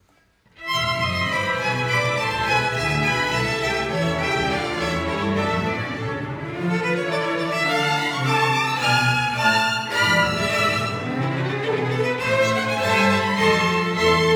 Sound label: Orc Wave, A-B Side; Stereo